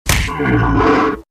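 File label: free spins grunt hit heavy; wild worlds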